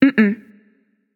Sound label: nv disagree; smite; awilix